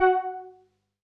505, String Machines

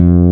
Fretless F1; Proteus 2000; Digital Sound Factory Proteus 2000

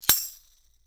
TAMSHA, EFFECT CYMB, 3D Drums; 3D Sound

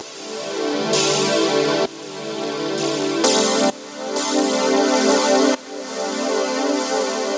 130 D Synth Wash Cycle; Zero-G; Future Wave

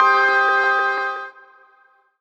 PIA MOOD FX, Gbm; Rawcutz - Nu Forms

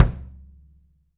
KICK, 3D Percussives, Studio Kit; E-MU Producer Series Vol. 5 – 3-D Audio Collection

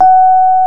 PNO, Rhodes Mk.V; Keyboards of The 60's and 70's

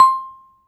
SHORT VIBRA C5; AKAI S-Series CD-ROM Sound Library VOL-7

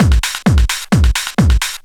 techno, DRUMLOOPS

